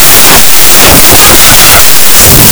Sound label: whatsapp notification ultra bass boosted